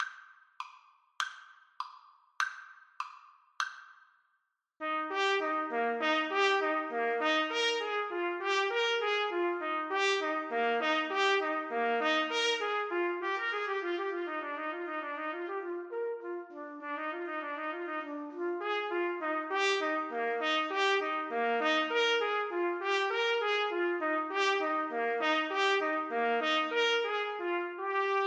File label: trumpet duet